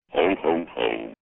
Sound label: hohoho